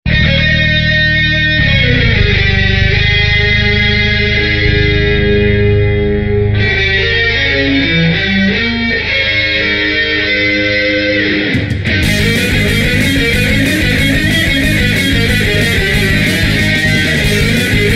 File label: Speed Metal; Gyruss